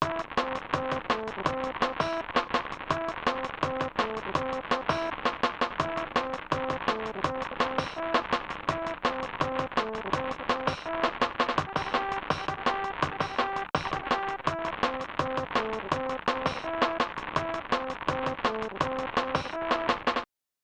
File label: Cadence; Famicom Wars Cadence; Super Famicom Wars; SNES